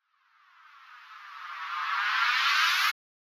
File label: white noise riser no tail; soundscrate